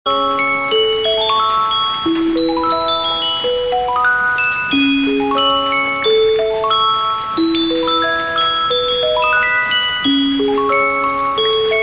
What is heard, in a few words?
piano instrumental